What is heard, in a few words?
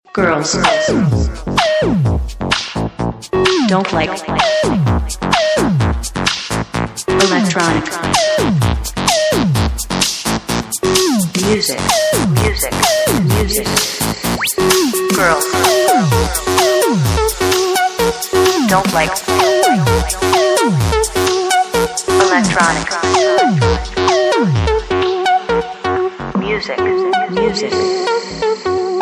electronic music